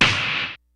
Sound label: G-FUNK KIT, DRUMS; Kid Nepro; Elite